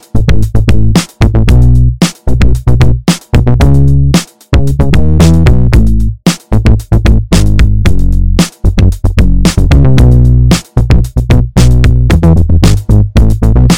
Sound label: bass igneous line